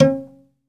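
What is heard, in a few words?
SOLO CELLO, Synclavier Strings; ILIO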